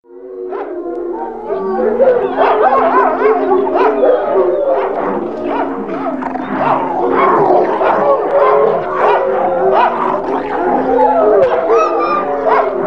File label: Hounds Of Hell, Halloween Sound Effects